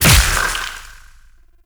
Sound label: Impact Large; Duviri; Incarnon Adapters; Weapons